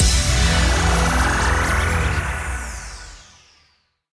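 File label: scatter Win Sound; king of slots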